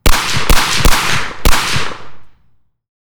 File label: FN Five-seveN 5.7x28mm 1m right clean Four shots; MKH8040